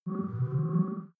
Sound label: game start deep, signale